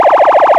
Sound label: hyperyelp, sirens; code3 excel